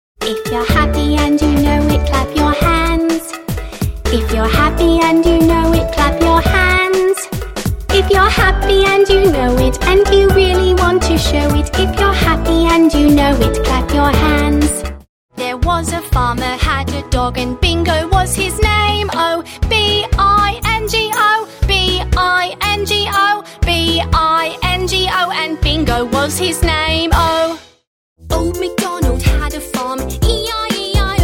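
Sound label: British Songs; UK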